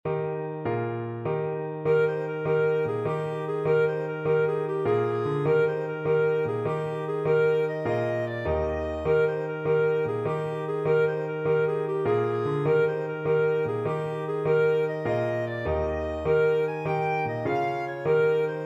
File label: jig clarinet